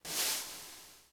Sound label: menu